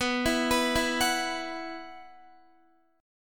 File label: B Suspended 4th, Guitar; Bsus4 arpeggiated; JGuitar